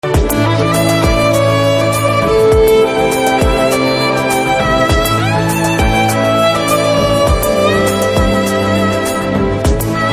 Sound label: BGM Ringtone; Tuck Jagadish